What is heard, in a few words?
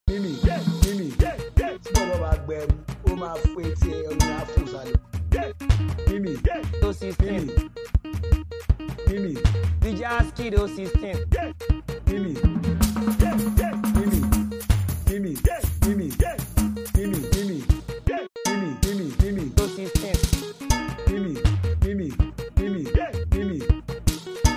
Beat